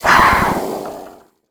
imp die, monsters; DoomRTX; imp5

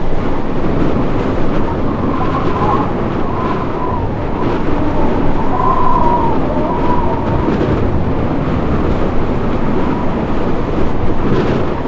wind high; Quake 4 Alpha; id software

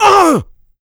pain large, player; DoomRTX; big

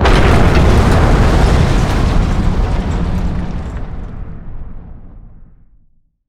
impact; Quake 4 Alpha

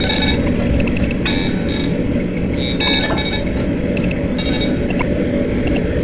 computer console, ambience computers; Quake 4 Alpha

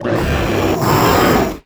pinky sight; DoomRTX

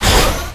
tank servo; Quake 4 Alpha; id software

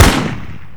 machine Gun Single; DoomRTX; gun revised

